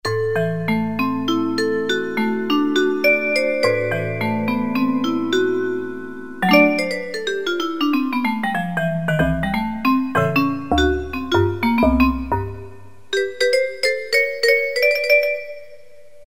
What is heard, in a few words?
Vibes